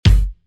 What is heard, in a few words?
Edm Acoustic Kick; EMP